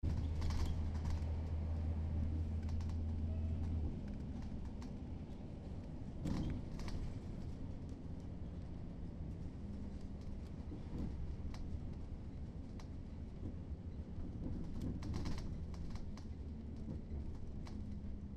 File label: helsinki local traffic bus